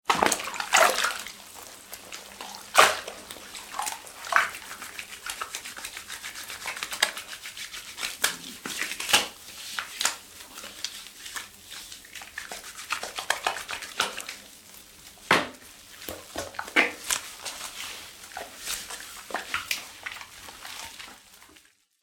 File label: household bath person washing; Person Washing Themselves In Bathtub - Splashing Of Water Whilst Rubbing Soap Lather Onto; Human; McKinneySound